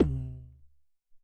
Vox Djemb

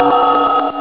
chord; Amiga Soundtracker Sample Packs

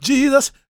GOSP MALE, Vocal Gospel; Vocal Planet Vol1 Gospel

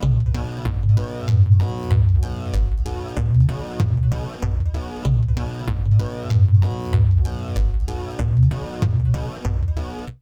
synth pulse + tabla C 120; Trance Formation

